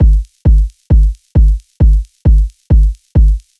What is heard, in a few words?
KICK; Locked Grooves; Smood